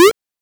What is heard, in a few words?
shoot, attack sound; カタナ１